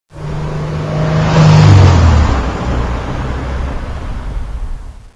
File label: cars; sven; labbreak